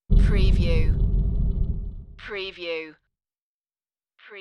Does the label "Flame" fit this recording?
Yes